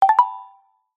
notification alert prompt bright ping pluck generic; zapsplat